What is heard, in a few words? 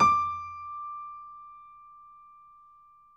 piano; East West; The Ultimate Piano Collection